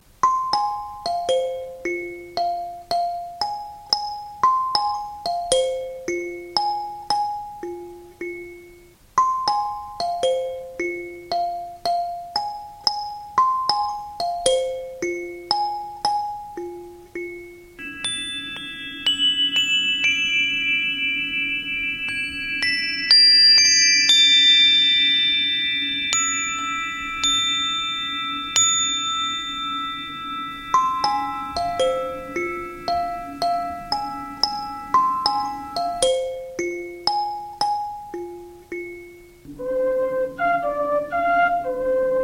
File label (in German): Klanglandschaft